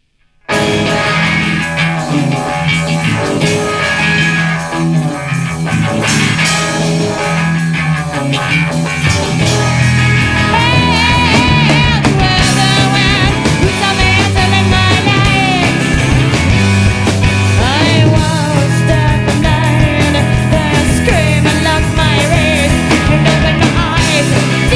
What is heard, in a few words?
lullaby